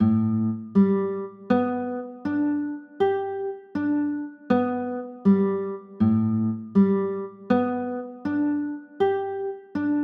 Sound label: a 11, chords